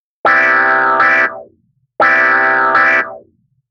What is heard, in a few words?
Deep Clavinet, Deep House